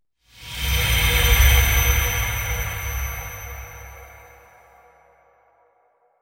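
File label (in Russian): магическое звучание; Короткие звуки для монтажа — для transition, движения, смены кадра, звуки для выхода эффек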